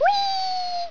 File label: wheee